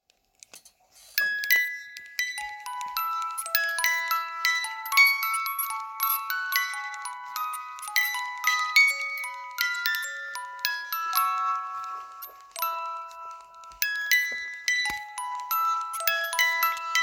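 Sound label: Boites à musique Manivelles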